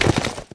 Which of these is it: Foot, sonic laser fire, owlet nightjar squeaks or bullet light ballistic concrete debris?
Foot